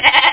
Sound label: sheep; F1 Licenseware - Volume 1; seamus